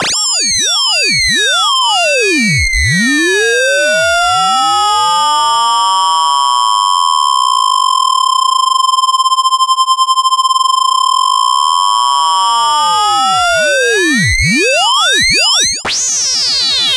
Osc Ringmodulator FM

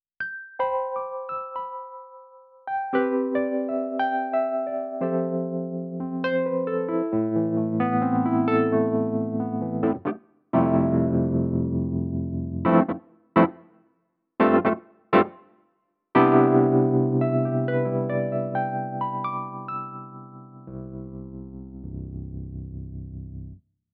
E Piano

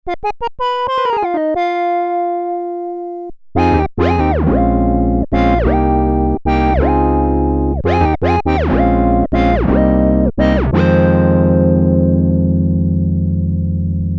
Mellow

